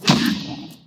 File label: parry sucess; weapons; darksouls